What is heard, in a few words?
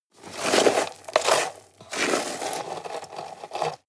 shovel dig